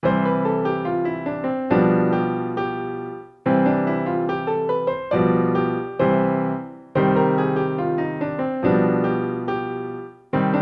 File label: 16th note in C and Cm